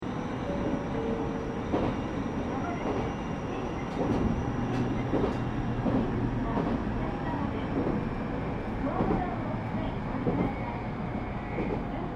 Train IGR Regional Sannohe Local Interior Stop, Japanese Trains; Train Sound Effects; Vehicles